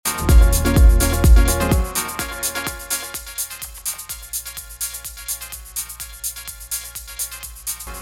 Reloop RMX 10BT nur Höhe

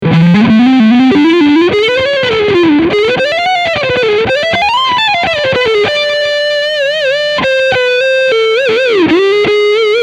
Leadsound Front HB

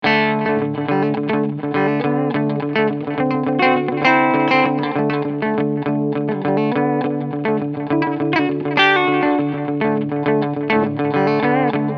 Clean Steg SC

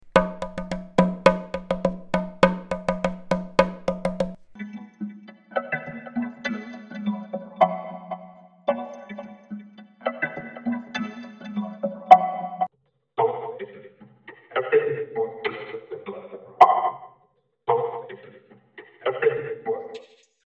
Carriermodus Sprache moduliert Percussion

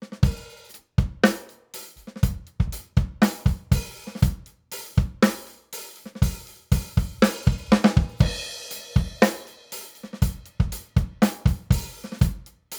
SSL UltraViolet Drum Bus ohne EQ

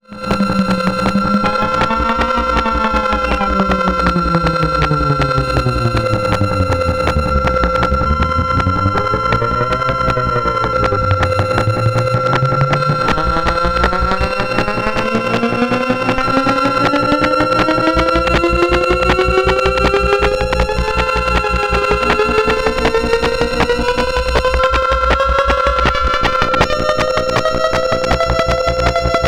SOMA ROAT Hektikgroove plus Chaos

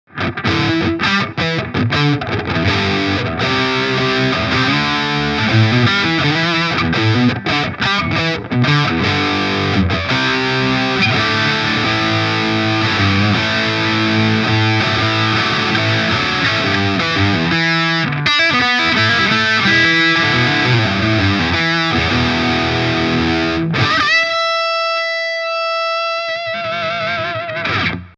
Gibson Les Paul Tribute Steg verzerrt

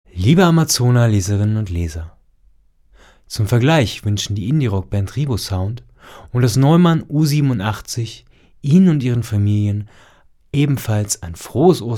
klangbeispiel u87

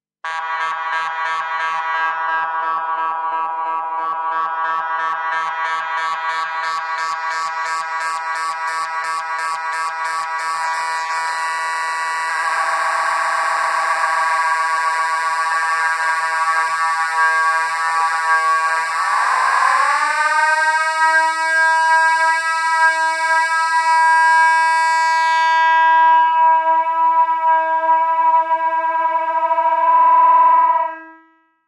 Shamisen mit Controller und Reverb

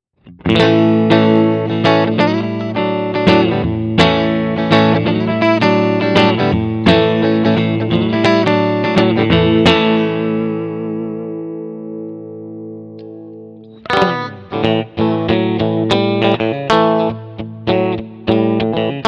Gold Foil Jazzmaster Pickup Kombinationen; Fender

